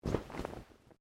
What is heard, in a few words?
Clothing Shirt Cotton Move; Movemement sounds for various articles of clothing; Dressing; Human